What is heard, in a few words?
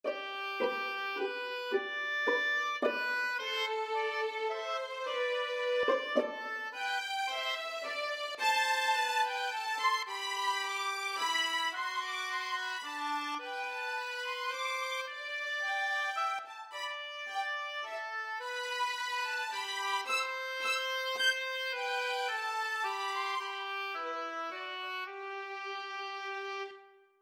pastores descant; descants; The task for this project was to compose a descant for three out of five given pieces.